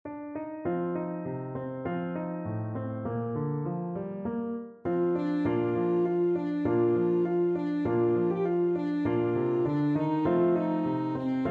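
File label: alto saxophone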